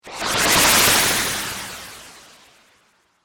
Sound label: Whoosh Magical; Whooshes (Sound Effects:Sound Design); BlastWave